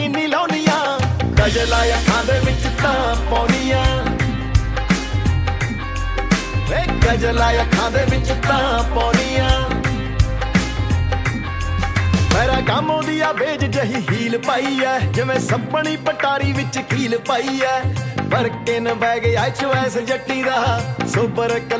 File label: best punjabi ringtone